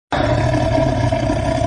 Sound of tiger

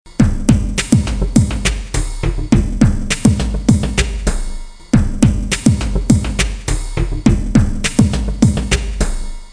Jazz drum beat music

